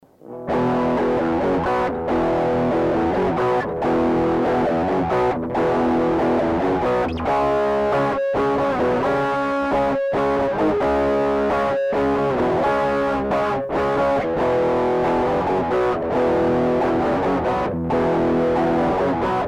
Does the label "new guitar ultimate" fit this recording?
Yes